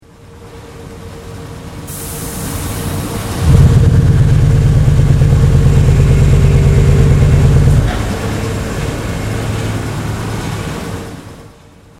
Industrial Machinery Unknown Single Cycle Detail; Various industrial machines; Machinery (Sound Effects:Machines)

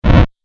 8 Bit Style Damage; Video Game Sound Effects

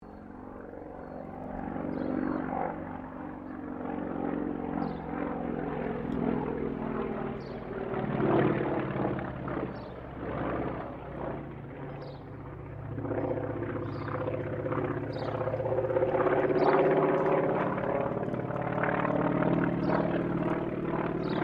AIRCRAFT CAC WIRRAWAY PASS DISTANT STEREO

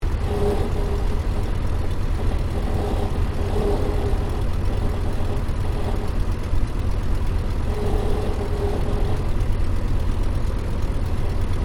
AIRCRAFT RYAN PT22 IDLE INTERIOR; 1942; Airplane Sound Effects; Airplanes (Sound Effects:Vehicles)

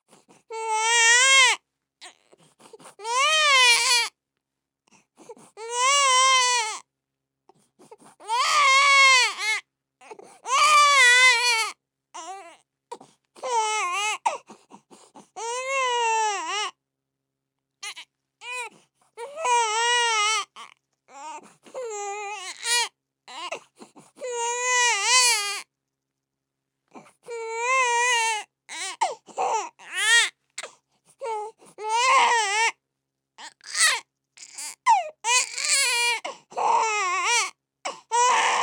Human Baby Crying Long; Babies; Voice

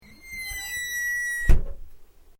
Door Metal Close Squeak; Various doors

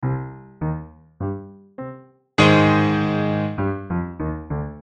Piano Sneaky, Piano Sneaky Villain Loop; Cartoon Music; Blastwave

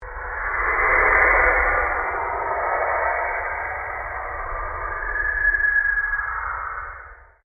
PULSE LONG SWEEP

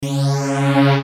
Synth Sweeper Flange, Fast, Bass, Rumble; Production Element, Imaging Element, Accent, Transition; Multimedia; Sweepers; Blastwave

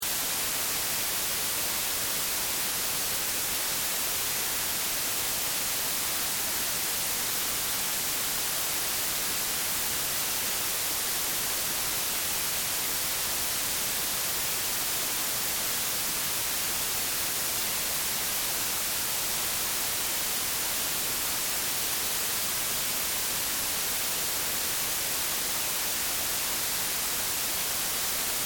Test Tone White Noise; Technology; Tones; Sound Design; SFX Bible

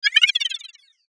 Transition High Flutter Descend; Multimedia; Blastwave

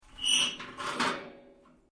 Metal plates scrape; Sound Effects:Materials

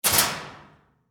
Locker Close, Sports, Locker, Door, Close; Locker, Room; Miscellaneous Sport Sound Effects

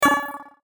button high tech

